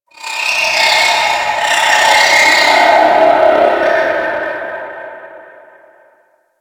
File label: Infested Hive One Off; Ambience; Props; Warframe; Corpus Infested